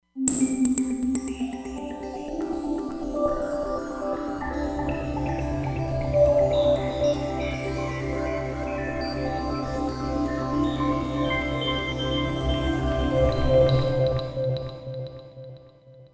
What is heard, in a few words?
Cosmic Pulse